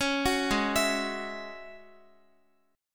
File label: Ab 7th Suspended 4th Sharp 5th Ab down Guitar